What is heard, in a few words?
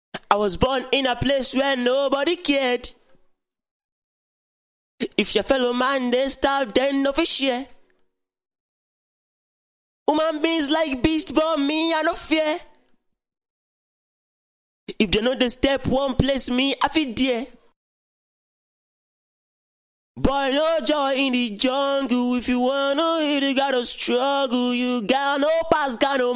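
hip hop pop 98 EM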